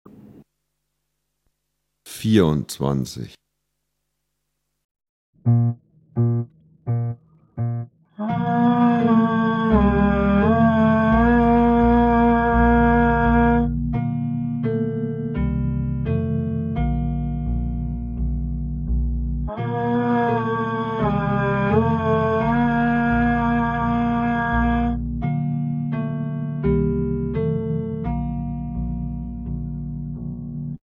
Klang, Hornschule

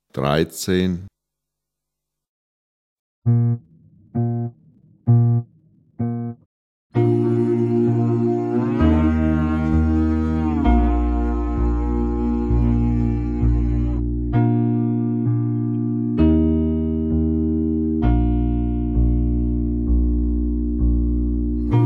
Klang, Hornschule

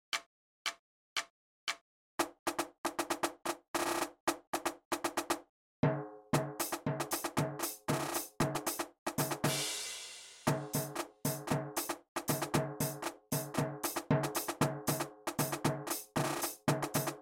Perkussion

